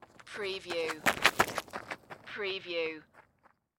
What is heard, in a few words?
Footstep Dirt Run